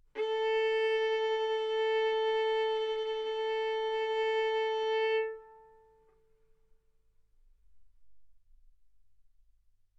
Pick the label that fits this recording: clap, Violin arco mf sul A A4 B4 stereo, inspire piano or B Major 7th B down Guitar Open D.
Violin arco mf sul A A4 B4 stereo